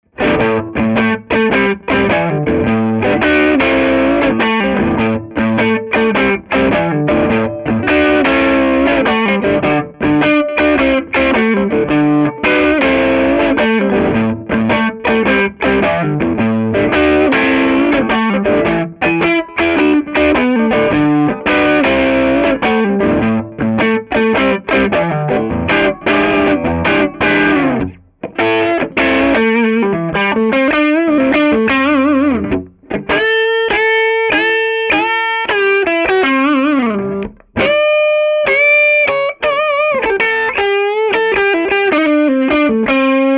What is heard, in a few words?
crunch neck; schwarzbach; HB59-GrowlDogs